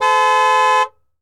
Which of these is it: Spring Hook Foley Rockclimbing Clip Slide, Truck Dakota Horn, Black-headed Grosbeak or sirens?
Truck Dakota Horn